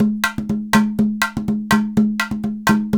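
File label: emelabo grooves; Best Service; World Colours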